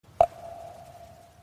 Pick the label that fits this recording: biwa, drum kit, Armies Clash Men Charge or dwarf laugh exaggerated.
biwa